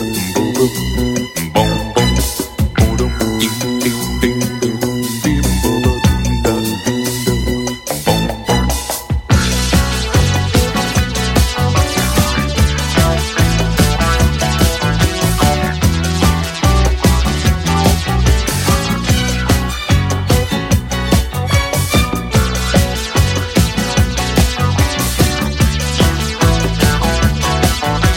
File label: funk